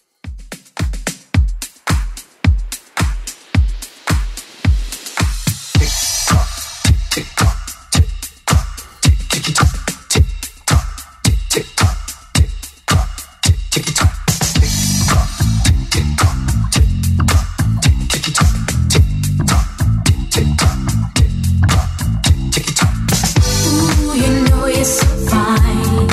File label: 80s Club Edit 109 bpm